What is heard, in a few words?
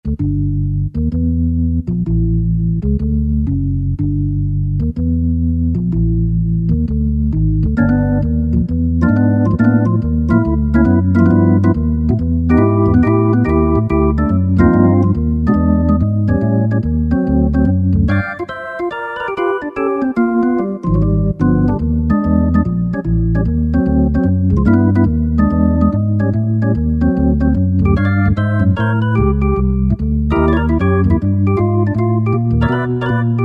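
Cool! Organ; Yamaha YPT-400; Voices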